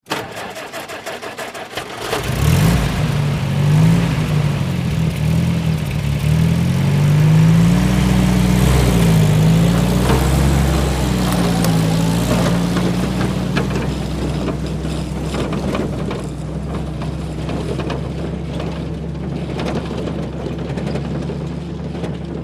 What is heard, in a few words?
Army Jeep Start Idle M